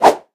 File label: lunge, hunter attack